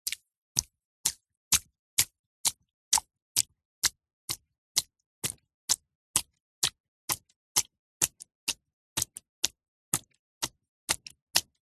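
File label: footsteps barefeet walking wet concrete swimming pool edge; zapsplat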